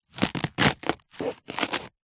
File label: leek raw cut slice knife single